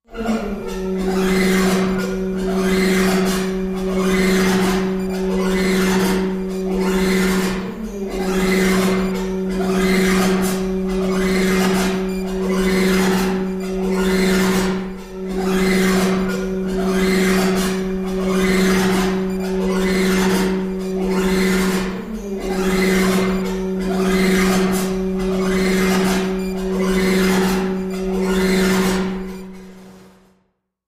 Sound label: Horn Slicer Machine Sc, machines winches